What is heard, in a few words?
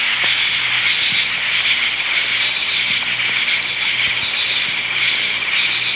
Crickets chirping at night